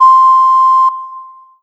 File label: Roland SC 88 Ocarina C6